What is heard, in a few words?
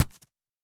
impact plastic; fallguys